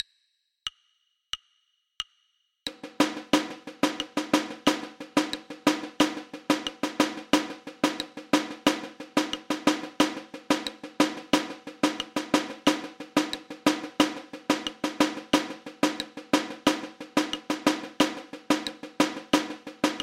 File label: Funk Groove Caixa 90